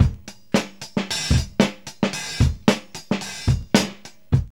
OPEN SHUT 112, Funky Elements loops; Zero-G

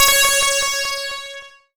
C#, Synclav FM; Big Fish Audio; SPACE1

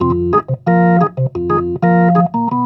B3 LOOP F, PLAYED

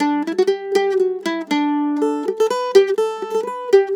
MANDOL 120 G; USB Soundscan vol.42; Celtic Flavours; AKAI

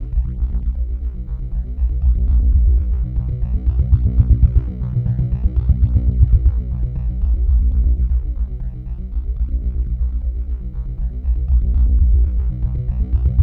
SUB WAVE, Special FX Twisted

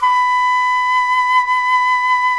Jazz Flute; Roland LCDP04 Orchestral Winds; 90 s Sample CDs; Jazz+Singin'

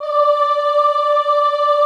OHH D4, Classical Choir; Best Service; Extended Classical Choir

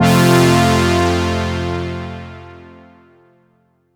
OB-6 pad, synths

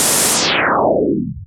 Air FX, Filtered Noise; noise hiss crackle

